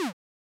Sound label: cancel; LD55